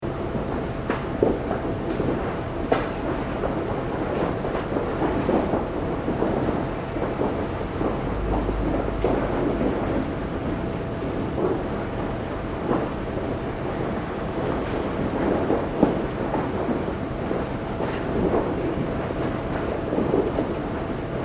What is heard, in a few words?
Industrial Tumbler Gigantic Operating; Loop; Giant industrial tumbler; Machinery (Sound Effects:Machines)